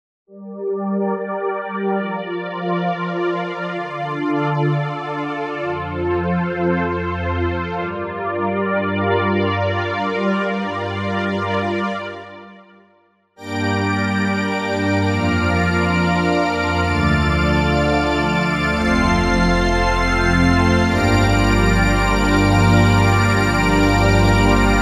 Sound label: hyp pads